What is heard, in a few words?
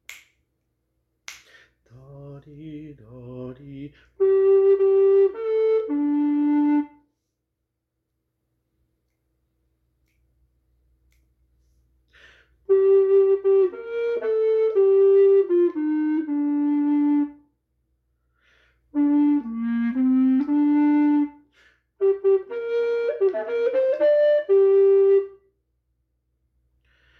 Bass Part B, recorder